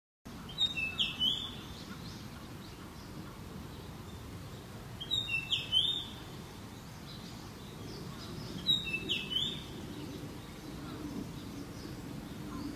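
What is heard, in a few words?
sendai mushikui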